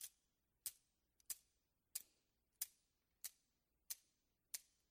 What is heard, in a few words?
knitting needles, pool